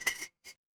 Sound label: glass ceramic